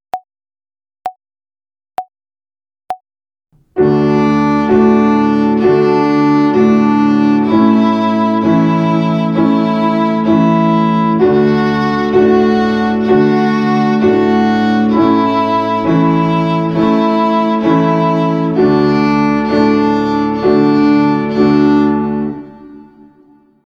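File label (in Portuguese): Ginástica até o dedo 2 - Corda RÉ - Quaternária, Violino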